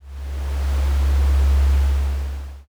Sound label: planet ambient